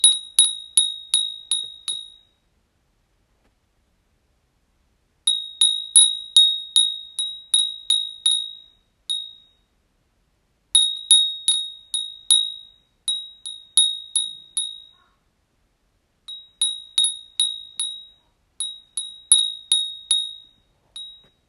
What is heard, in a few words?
windbell